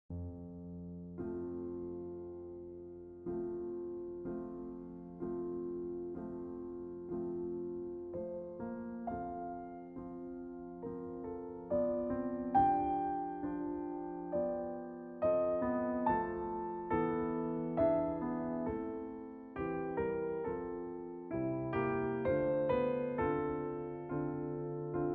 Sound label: accompaniment; fauré; op 4 no 2